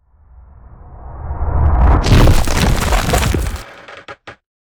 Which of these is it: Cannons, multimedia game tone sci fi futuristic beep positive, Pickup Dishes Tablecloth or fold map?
Cannons